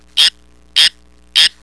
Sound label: frog